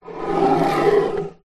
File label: wood object drag scrape on wood shelf light; foley